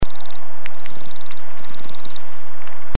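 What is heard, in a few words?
waxwing voice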